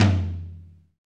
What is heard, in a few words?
TOM TOM, Real